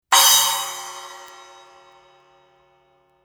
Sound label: 8 splash; sabian